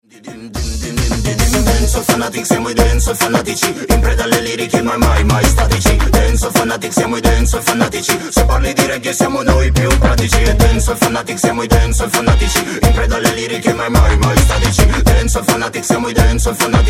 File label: dancehall